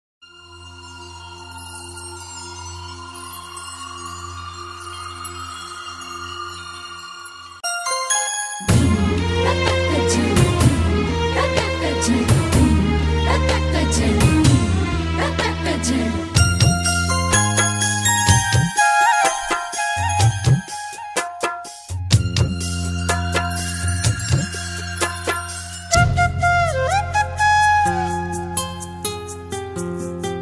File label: (Instrumental); BANGLA; Love Birds (2011)